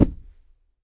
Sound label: compact grass land; Golden Crayon Sounds